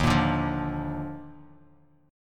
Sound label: D sharp Major 7th Suspended 2nd; strummed; JGuitar